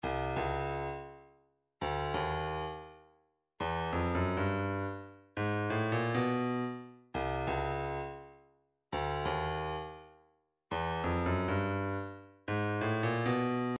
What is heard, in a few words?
135 snare; Postal